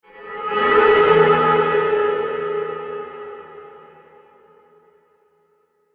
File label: Digital Screech, Reverb; Production, Element, Reverse